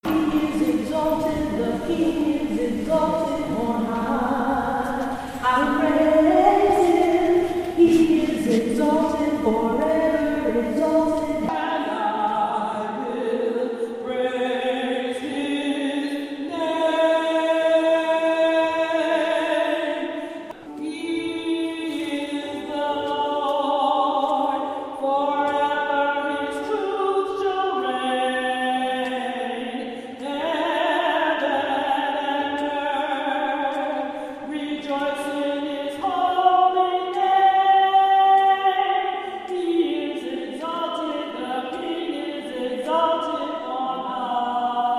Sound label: TENOR